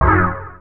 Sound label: chords